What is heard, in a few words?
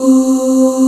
Oohs C3; Proteus 2000; Digital Sound Factory Proteus 2000